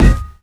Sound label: physics cube md; Portal 2